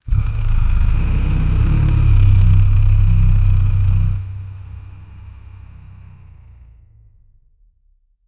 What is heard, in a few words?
performance turbo engine